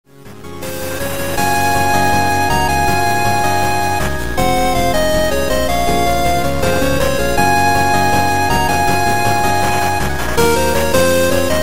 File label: 8bit; hunterxhunter